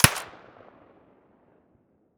svd silencer mono distant

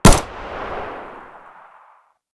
fiveseven muzzle mono distant, weapons

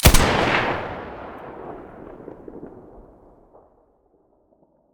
sa58 muzzle, weapons; STALKER; IWP

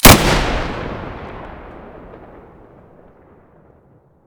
muzzle sv98 stereo

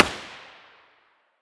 muzzle g36 mono far, weapons

